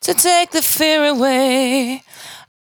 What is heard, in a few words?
92bpm Bb, Velvet Vocal; Zero-G